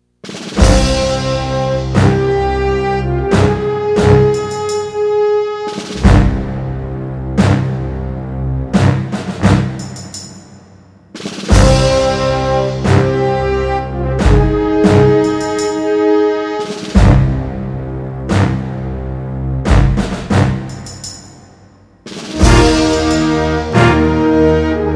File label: music roman fanfare